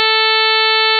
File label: power all sin, synthesizer; lange-nacht-2009